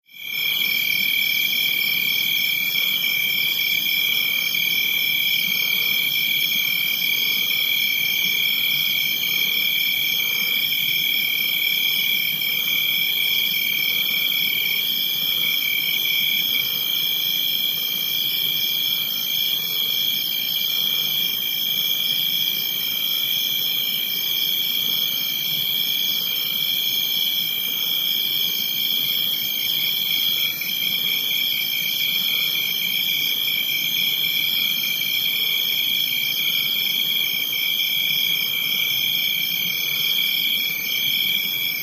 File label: Crix Crix Buzz Dist, crickets